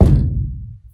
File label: appliance hit; hits